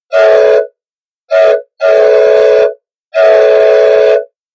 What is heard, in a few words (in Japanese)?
kiteki